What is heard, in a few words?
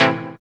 DR. LOOP; X-Static Goldmine 3; CHORDS